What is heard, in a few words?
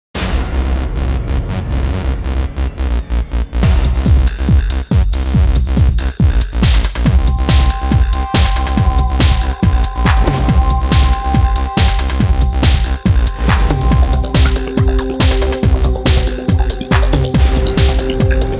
Action Techno